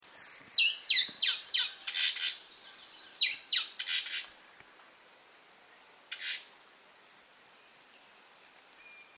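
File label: Martín Pescador Rojizo, Chloroceryle inda, Green-and-rufous Kingfisher; cantos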